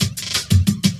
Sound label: LOOP SD, Drum & Bass, 180 BPM; Ueberschall; Drum & Bass Resonance